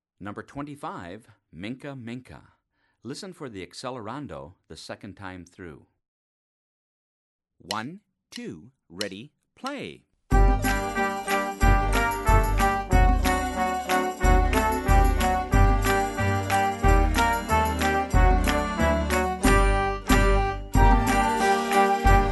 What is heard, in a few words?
minka minka, band